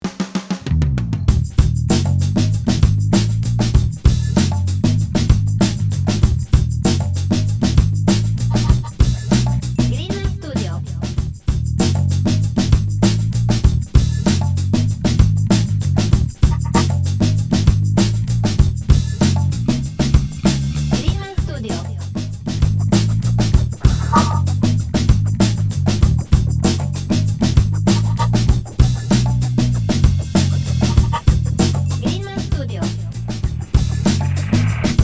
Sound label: Bass funk loop